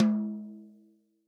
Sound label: REAL DRUMS POP KIT